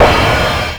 CRASH, Drums Dance & Groove

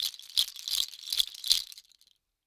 Deer Toe Shaker, Native American; Sonic Foundry (Sony Creative Software); Continental Drift Aftershocks; Shakers

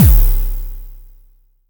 Kick; ModelCycles

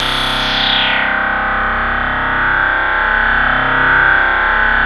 SYN, 160 BPM, Drum & Bass; Ueberschall; Drum & Bass Flash Trackz

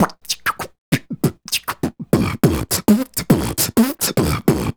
Beat Box, Hip-Hop and RnB Vocals

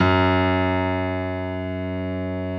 F#1, PIANO; AKAI S-Series CD-ROM Sound Library VOL-8

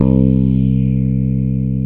BREATH, MORPHEUS

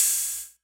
hihat; Big Fish Audio; Hardcore Breakbeat Action

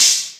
hh mid, Yamaha TG-500

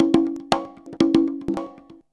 CONGA 119; USB Soundscan vol.56 - Modern Percussion Loops; AKAI

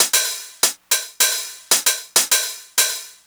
909 Hat

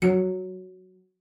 CELLO FINGER F#3; 90 s Sample CDs; Xsample VOL-5

